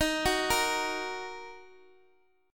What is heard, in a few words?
D sharp Minor, chord